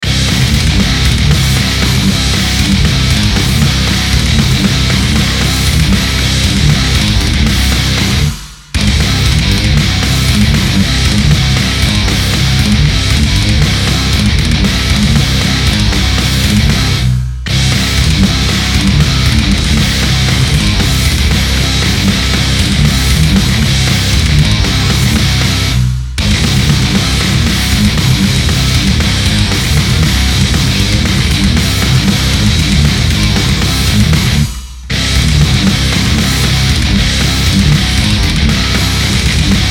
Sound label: metal midi kits 4 4; daowaves